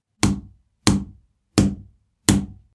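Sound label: hit sound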